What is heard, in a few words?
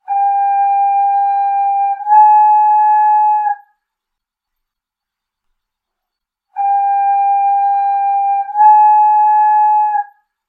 tofu truck